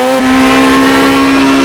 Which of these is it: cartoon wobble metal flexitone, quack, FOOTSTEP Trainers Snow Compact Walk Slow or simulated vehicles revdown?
simulated vehicles revdown